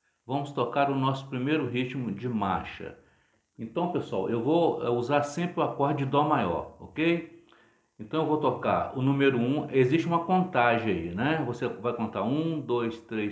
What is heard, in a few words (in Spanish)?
Ritmo de marcha